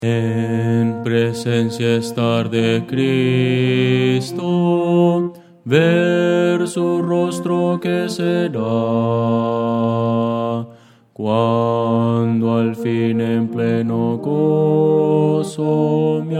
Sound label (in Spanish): bajo